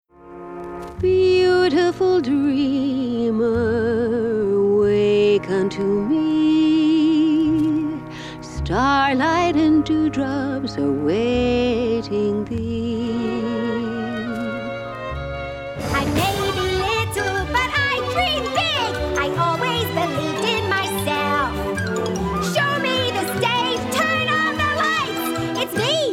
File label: jingles; EM Voices